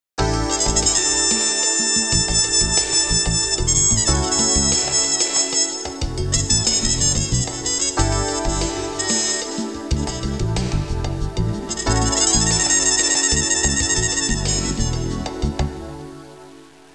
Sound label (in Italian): suonerie musica jazz